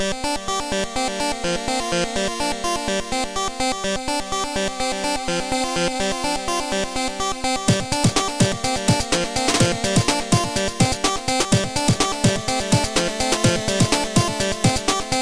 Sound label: soft techno; Trackers; Modland; Onyx